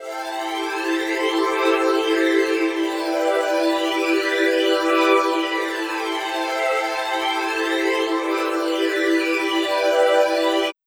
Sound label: pad